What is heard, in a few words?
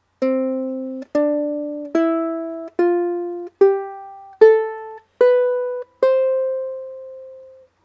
cmaj, ukulele